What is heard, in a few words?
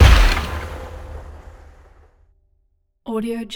complex impact